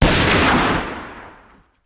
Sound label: Hand Gun; Sound Ideas; Guns